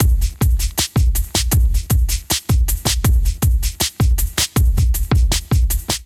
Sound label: Kit 79 bpm, Drums; MIDI Clips; Zoned; Ableton; Chop and Swing